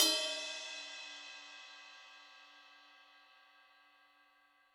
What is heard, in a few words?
Ride Bell Stick Heavy; Drums